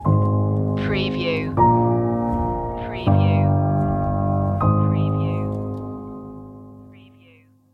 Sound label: rainbows stinger